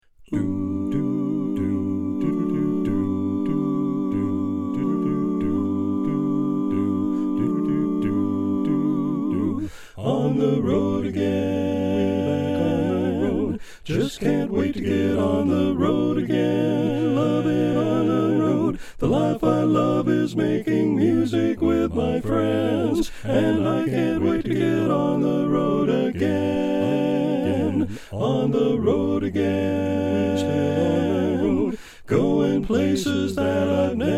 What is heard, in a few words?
Tenor